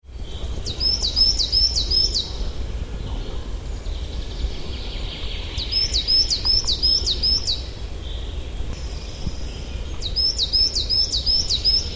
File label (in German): tannenmeise